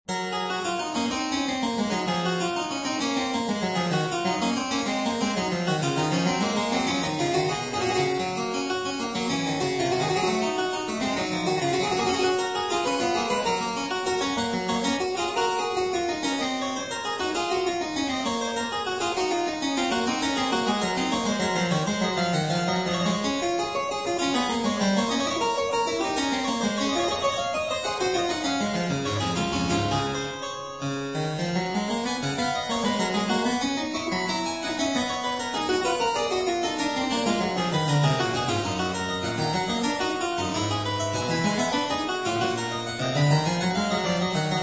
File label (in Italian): Fughetta a 1 Clav.; Variatio 10